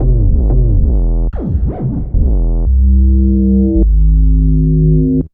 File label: Victory Bass, Drum & Bass; Fluid Dynamics